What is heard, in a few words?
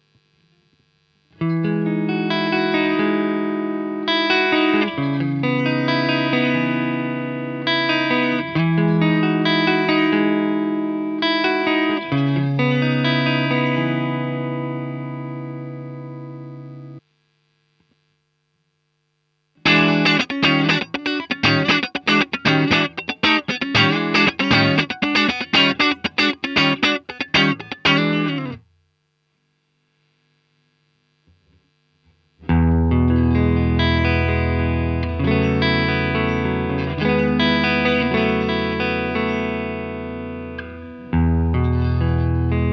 Fender Deluxe